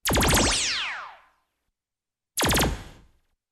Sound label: POWERSHOT